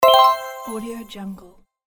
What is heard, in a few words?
positive game win; gamechestaudio